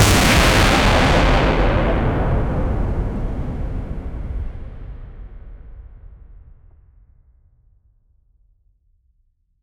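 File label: blast explosion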